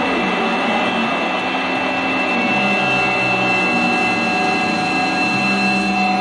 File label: distorto noise brigade, Atmospherics